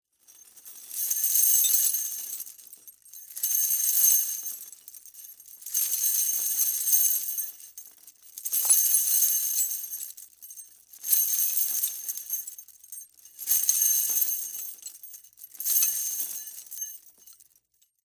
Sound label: Chains Rattle, Horror, Chains, Rattle; Scary Soundscape Sound Effects; Blast Wave